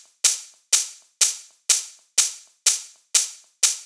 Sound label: Big EDM Hat Loop, 120 BPM; Future House Tools; Big EDM Drum Loops